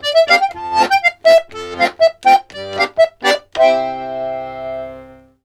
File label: 120 POLK, Accordions; USB Soundscan vol.40; AKAI